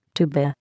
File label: tuba